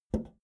video tape player plastic play release

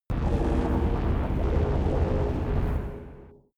space inside biomedical alien spaceship windy

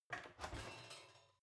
oven open baking sheet ringing soft

